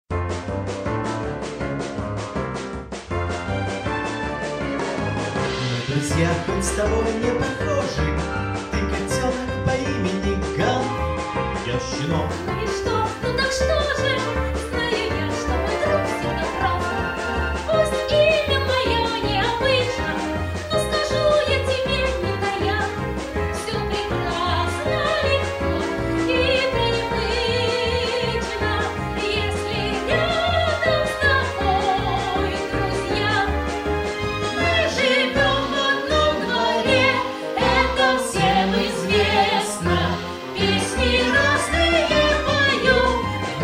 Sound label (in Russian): Pesenka, Vokal; Kotenok Gav